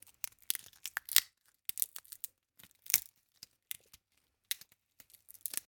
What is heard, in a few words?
Crunching Twigs